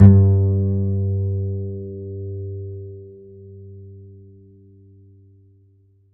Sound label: DBASS G; Univers Sons; Jazzistic